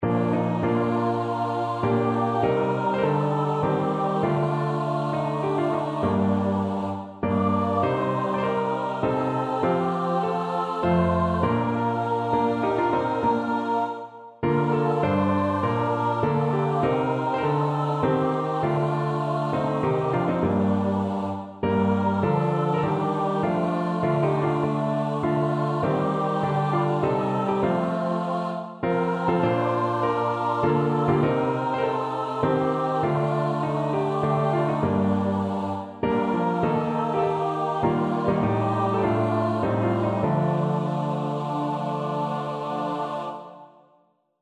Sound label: CHOIR; hitragut